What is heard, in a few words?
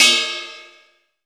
Linn 9000 bell